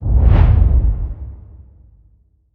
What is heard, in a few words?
Whoosh Calm Weighted Transition